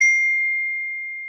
iPhone Whatsapp Sms

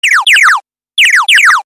Alien Phone

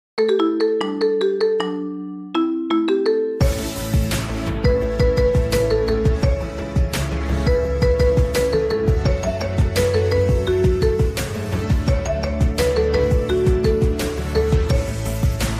Marimba

